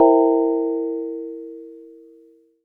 E-Mu Proteus FX CosmoBel C3

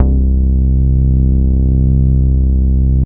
Ensoniq ZR-76 Big Bottom C2

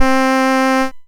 Casio Trumpet C5

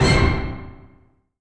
Stereo Hit; Korg N1R

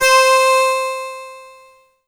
Yamaha TG100 Metal Pd C5

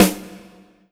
Korg N1R Snare Drum

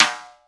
Snare Drum

